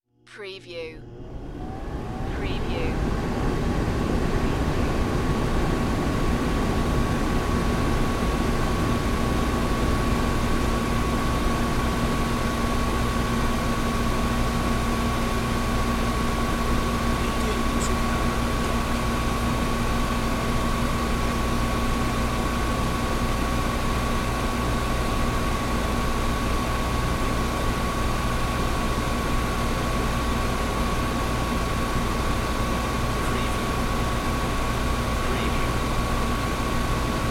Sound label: Air Conditioner Window; Household Sounds / General Household; sound effects bible